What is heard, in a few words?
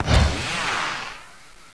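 fireworks launch large; tfc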